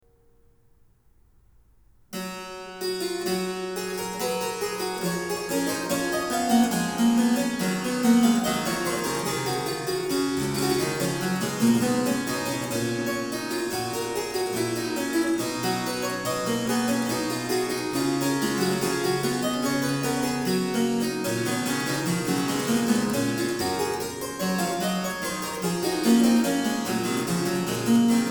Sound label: Harpsichord; Variatio 12. Canone alla Quarta. a 1 Clav; Bach; Goldberg Variations; J S Bach; BWV 988